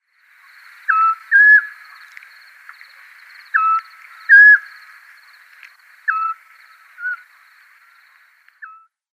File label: European Scops-Owl display pair